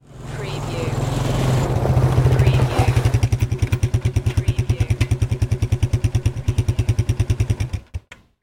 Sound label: Quad Bike Pull Up and Shut off